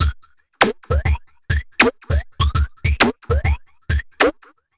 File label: drloop, drumloops, Vinyl Attack; Masterbits